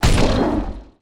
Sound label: Burning Lava Golem damage